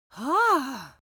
cartoon vocal amazed grandma; smartsoundfx

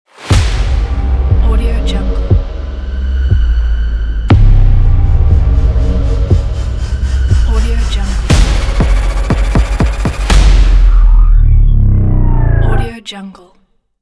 impact cinematic intro

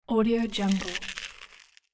slow bite into chips

